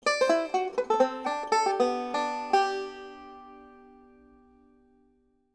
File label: ringtone, banjo